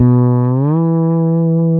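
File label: SLIDE B-E, FRETLESS BASS; Northstar - New Gold VOL-2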